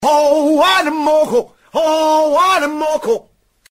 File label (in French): chant africain